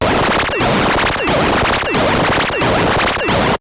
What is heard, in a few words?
synth FX; AMG - Vince Clarke Lucky Bastard